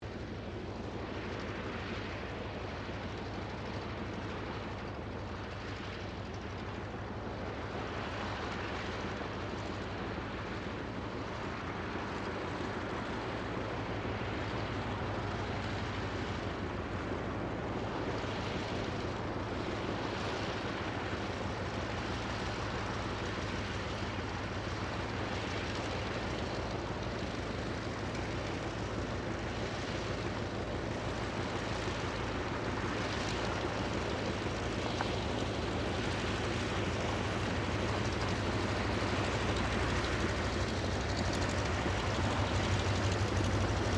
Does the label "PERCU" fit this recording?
No